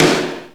Clap; osu

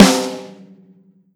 snare

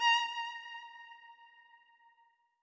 violin

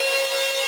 synth main

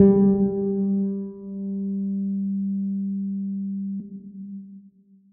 First Bass G

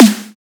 Muted Snare

